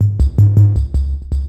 SNTHBASS D+B 160 C, Drum Bass, Bass

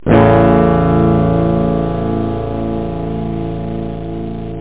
guitar

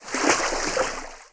FS Water; kult

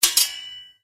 Fencing Parry; Pirates Of Treasure Island

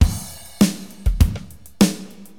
DM LOOP, HipHop, Drums

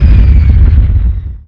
Afterburn end; Phoenix

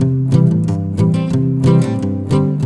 Country, Acoustic, Guitars; ACID MUSIC

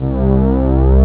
analog, synth chords

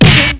oldstyle dirty mid, bdrum samples

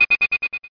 fadeout

